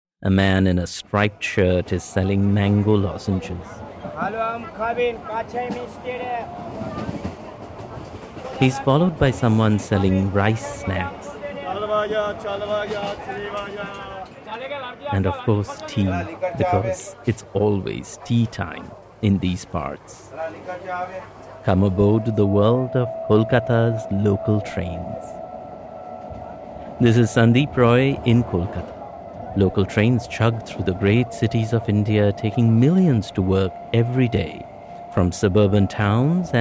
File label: train song